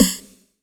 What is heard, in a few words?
ELE SD, Drums Dance & Groove; USB Soundscan vol.11; AKAI; ELEC. KIT